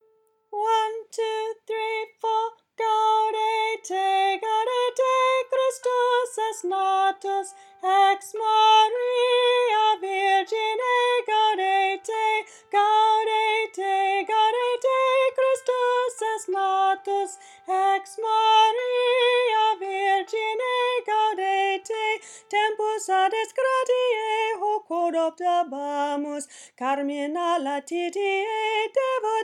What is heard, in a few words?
soprano melody; Folk Choir; Gaudete